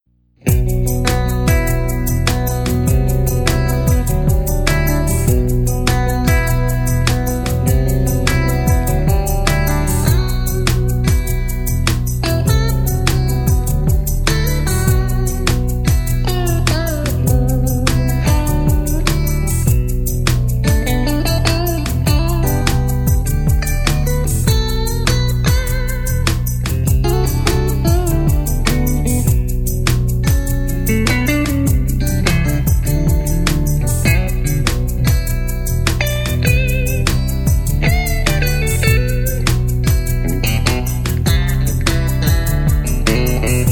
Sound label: clean